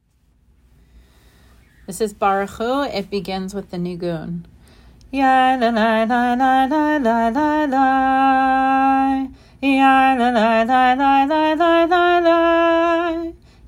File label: Barechu melody with niggun